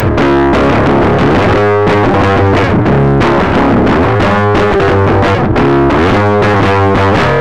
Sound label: octave clang rock; deathbyaudio